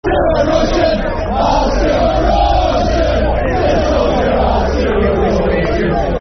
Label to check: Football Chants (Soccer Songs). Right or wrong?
Right